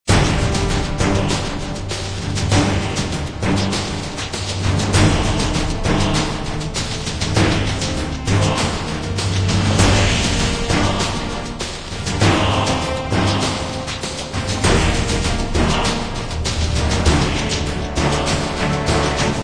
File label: Music Boss; Brave Fighter 2 Monster Legion; Android